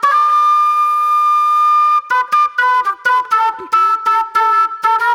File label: Sharp Delay Flute